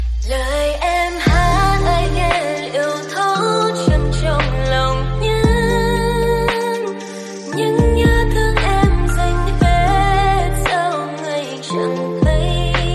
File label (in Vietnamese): Nhac Chuong Lofi Ver